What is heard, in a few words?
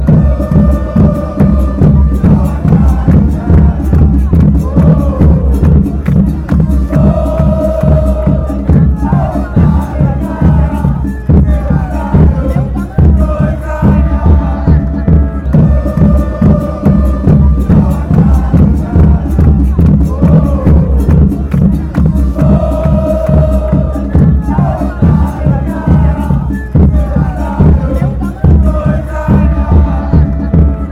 huracan chant, argentina